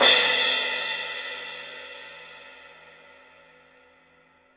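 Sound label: CYMBAL, real drum kit; HIPHOP KIT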